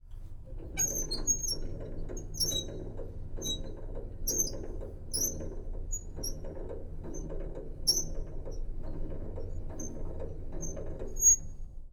M18 foley cannon elevating manually slow from inside, Tank Destroyer; M18 Hellcat Tank Destroyer; Pole Position